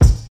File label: punchy bass drum one shot g key, steel kick drum sound tuned to the G note; kick drum samples